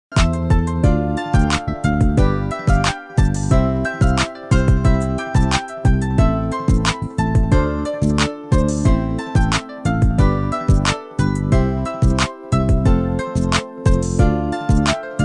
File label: happy pop flick